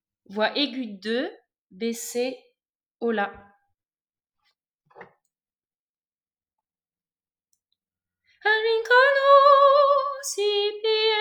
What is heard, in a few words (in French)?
Voix aige